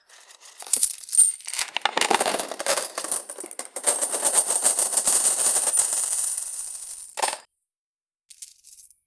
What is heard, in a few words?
treasure chest opening, gold coins